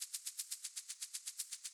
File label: Mosquito Fly Loop; gamerip